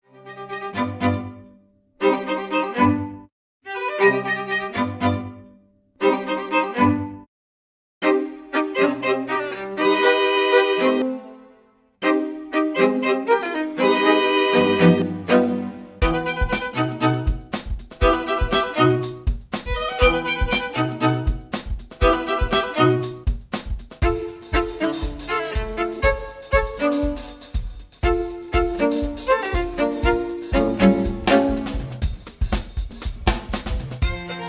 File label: Classical Fusion